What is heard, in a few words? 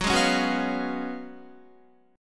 Gb Major 7th Suspended 2nd Suspended 4th, Guitar; GbM7sus2sus4 strummed; JGuitar